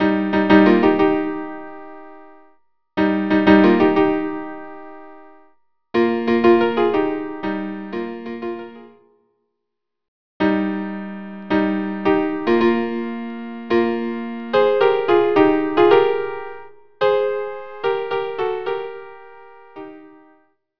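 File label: Duets violin violin; Schubert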